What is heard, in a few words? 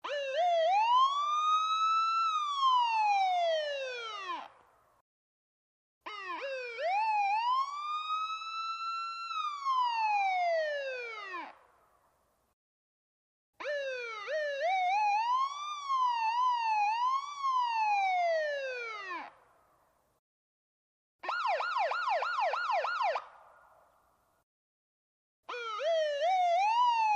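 Ambulance Wailer Siren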